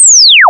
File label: discharge; DroidQuest